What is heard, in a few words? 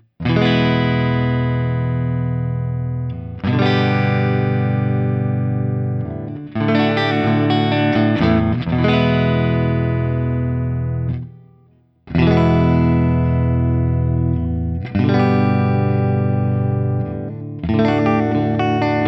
Guild BB ODS; guitar; Guild Brian Setzer Bluesbird